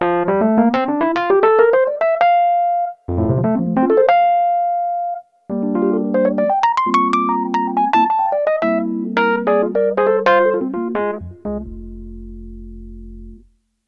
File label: rhodes